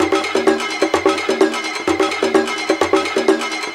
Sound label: Latin House Loop 128 BPM; Vengeance Samples